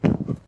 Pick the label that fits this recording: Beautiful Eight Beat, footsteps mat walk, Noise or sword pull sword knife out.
footsteps mat walk